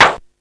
METAL, ELEC PRCS; East West; Global Sounds

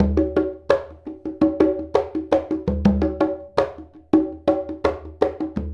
Congas; Essential Percussion

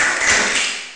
weapon quit; ST-XX Amiga Soundtracker Sample Packs

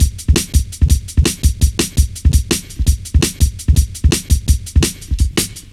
Fat Stepz, Drum Loops 167.5; Zero-G Jungle Warfare 1, 2 & 3

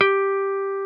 Jazz Guitar

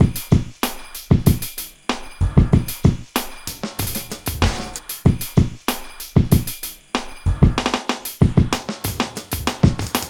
Drums, Hip Hop

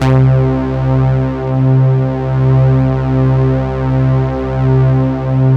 C2 prophet pad; Sound Gallery - Charged

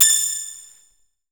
SYN BEL, FX Synth Bell; AKAI S6000 CD-ROM - Volume 5

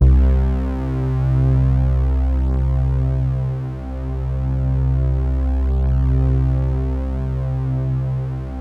C2 analog plug; Sound Gallery - Charged

